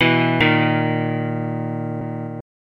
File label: Suspense Queue